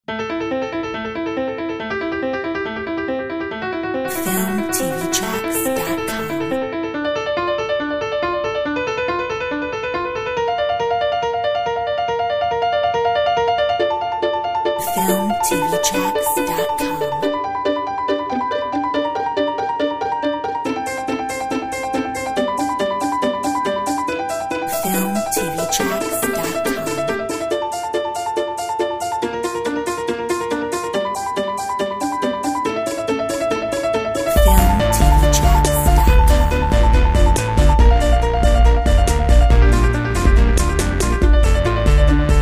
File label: Avant Gardiste